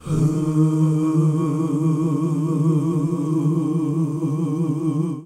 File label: OOH EB2, GOSPEL; Best Service; Hallelujah; GOSPEL 10